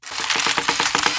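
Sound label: big bow, attack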